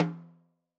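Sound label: Son Snare Off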